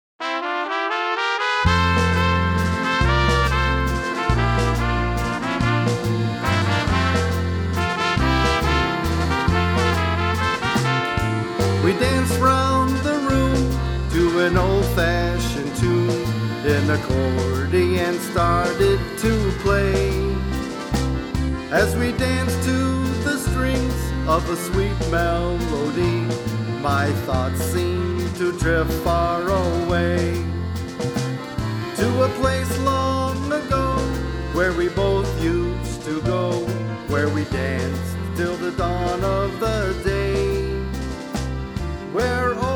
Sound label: An Accordion Started To Play Waltz